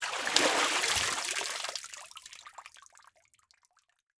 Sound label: Liquid Water Water Feet Splashing With Drips